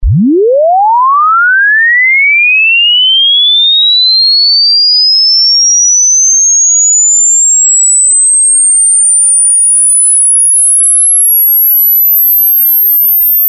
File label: sweep 20-20k lin